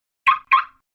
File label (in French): alarme voiture